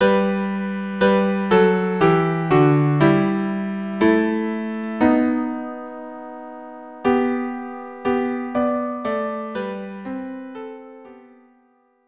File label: viola duo or viola cello duo; old 100th doxology